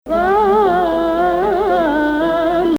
Hijaz, maqam

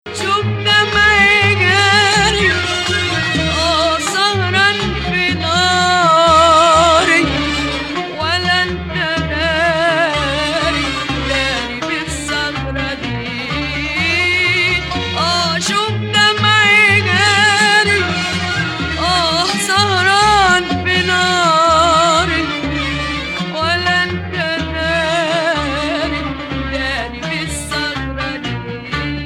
Nahawand - Rast, maqam

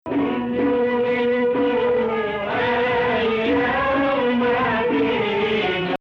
2nd Rast, maqam

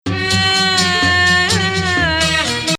Hijaz, maqam; Rast

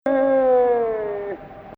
2nd Rast, maqam

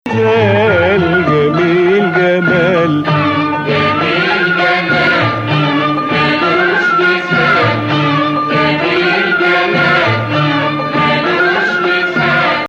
Nakriz, maqam

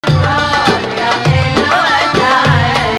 Rast, maqam

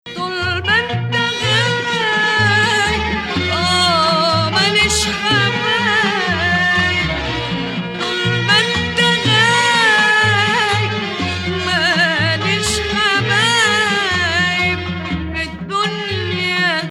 Nahawand, maqam; Rast

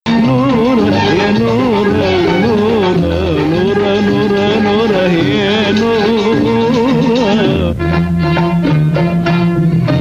Bayati, maqam; Bayati Nura

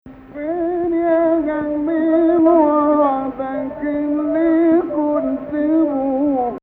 Rast Rast Octave

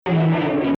Rast, maqam

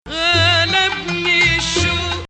Ajam, maqam

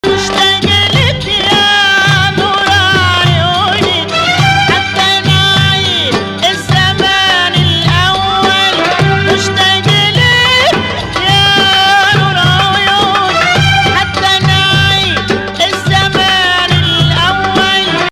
2nd Saba, maqam

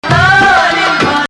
Nahawand, maqam

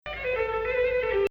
Nahawand, maqam

